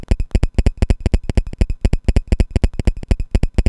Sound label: Rhythmic loop, 90BPM; musicradar; bitcrushed; Crushed Loops